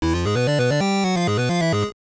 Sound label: Battle Intro